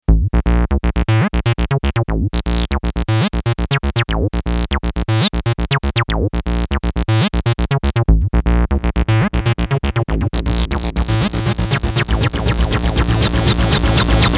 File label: Delay